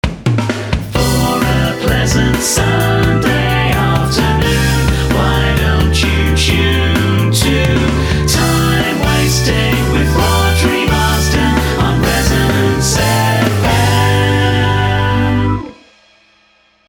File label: jingle